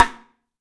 MARFSN, 3D Drums, X STICK; 3D Sound

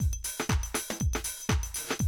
Classic Drum Loops

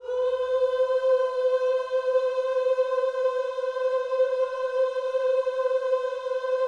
OOS C 5, GOSPEL; Spectrasonics; Vocal Planet

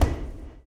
STOMPS; HOW IT IS; Spectrasonics; Vocal Planet; Blues Soul

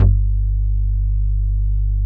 THICK BASS; Greytsounds; Scott Peers Sound Engineering

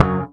SHRT F#, Bass; Sampleheads; Will Lee Bass Library

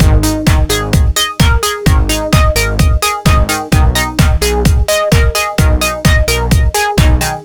129 bpm full, combi loops, nu dance electro; Zero-G - Soundclash; Dead And Alive

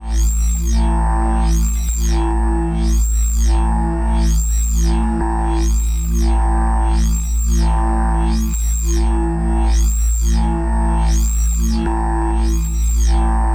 Atmos; neuro; InsideInfo

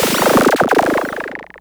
Cwejman S1; Birrgerr; DevSnd Cwejman S1 - RD

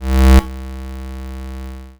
C3, Synth Hits; Zero-G; Soundclash Nu Dance Electro; Acidized

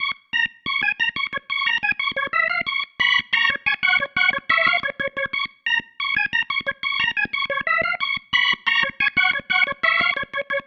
Pattern, Organ

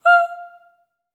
STACC, Symphony of Voices, SOP STACC

